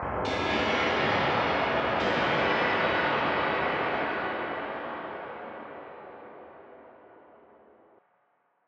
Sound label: smash; cardboard kaiju